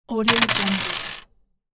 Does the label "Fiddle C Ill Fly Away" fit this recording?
No